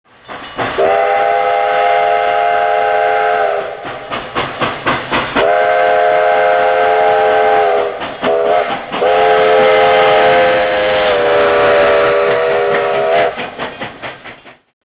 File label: steam loco sound